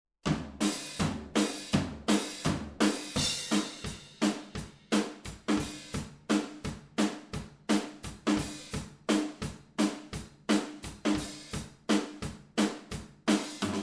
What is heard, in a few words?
Drums MONO M-S